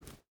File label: footstep rubber boots forest jump start; Rust